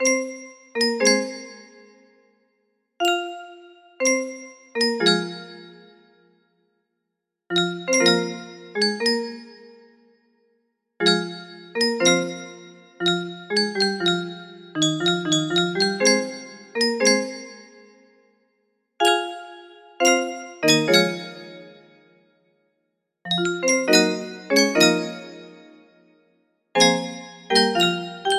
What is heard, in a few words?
melody; unus annus